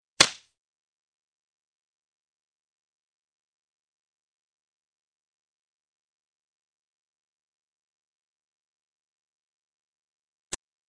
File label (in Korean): Fights, 싸움-전투; 헐리웃특수효과음